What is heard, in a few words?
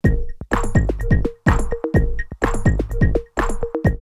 drumloops Rave 126; X-Static Goldmine; 90 s Sample CDs